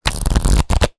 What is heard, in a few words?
money notes bills wad flick through; zapsplat